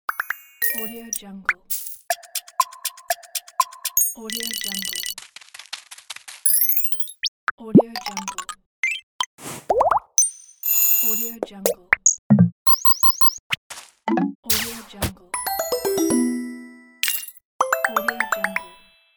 game sounds

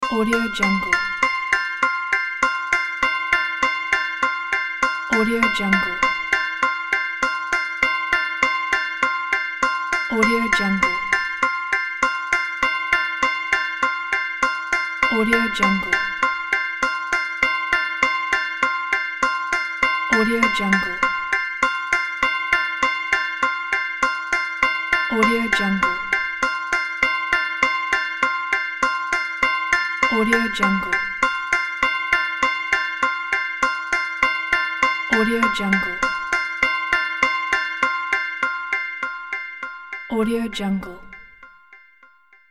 game show

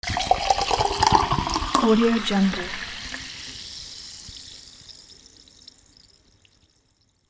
pouring soda

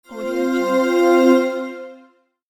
heal

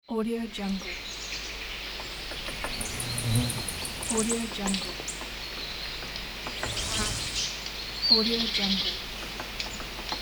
in the woods

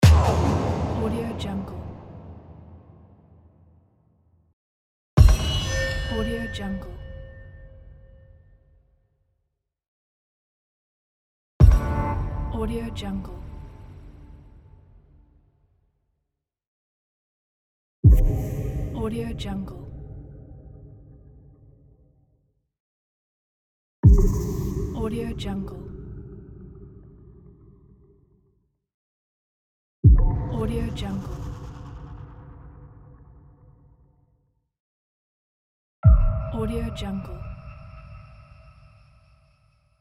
big impact pack

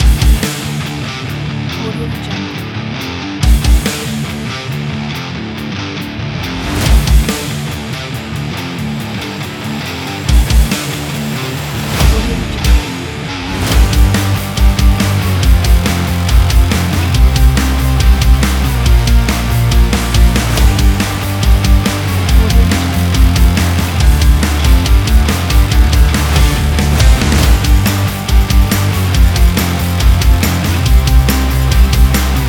aggressive sport rock metal

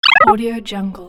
game chirp

